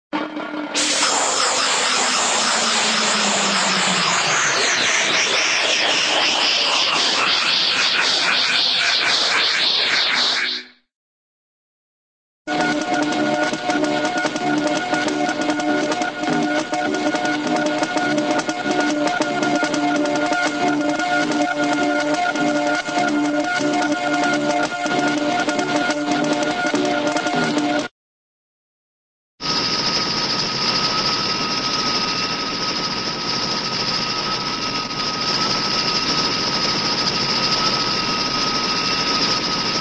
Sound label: sci fi burst off; cartoon